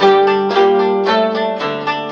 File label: guitar japanese; Loops